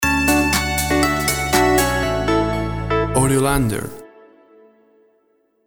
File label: Upbeat Groove House Stinger